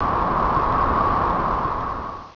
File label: Wind; pokerogue; battle anims